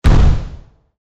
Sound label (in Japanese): カウントダウン